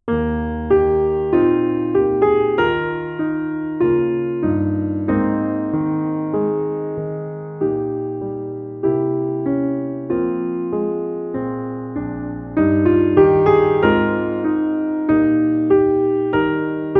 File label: Vocalise Accompaniment